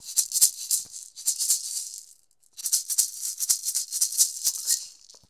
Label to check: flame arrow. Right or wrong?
Wrong